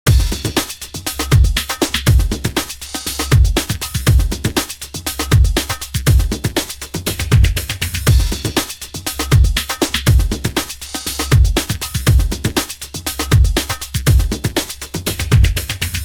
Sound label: drumloop lpfilter